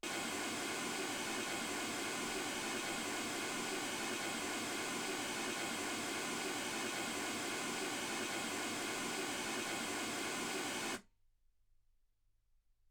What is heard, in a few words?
mls 48kHz